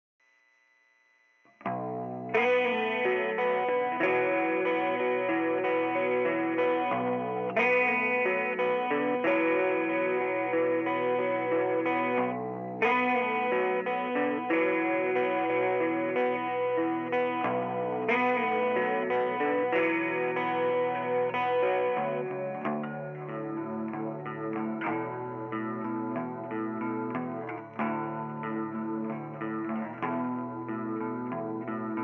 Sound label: chorus samples; dimensional d 4